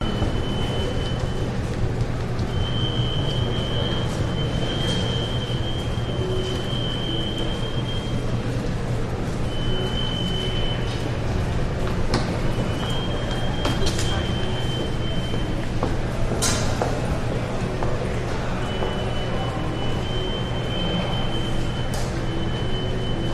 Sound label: seabus station walla beeping steps walking people ambience; vancouver; waterfront station; clacking; your free sounds